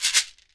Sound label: SHEKERE, Synclavier Percussion; ILIO